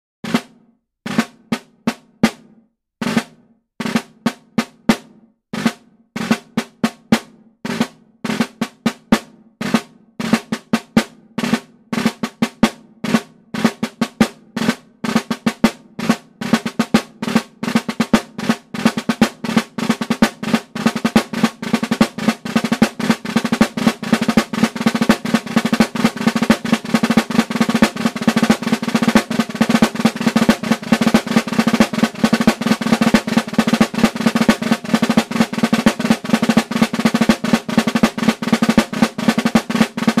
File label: Double Ratamacue closed, rudiment